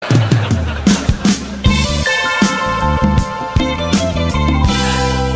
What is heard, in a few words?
Groovy Tone